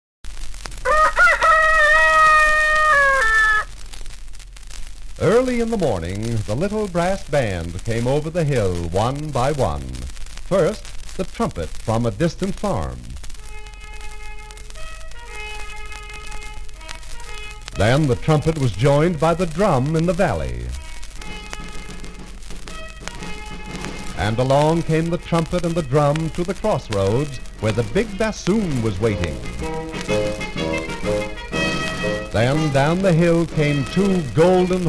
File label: brassband; Kiddie Rekord Music Library